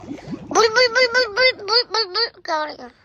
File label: bul bul bul